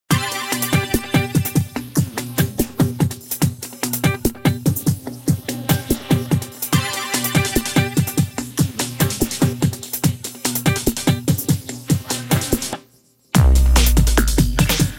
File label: Instrumental